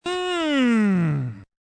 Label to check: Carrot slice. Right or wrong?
Wrong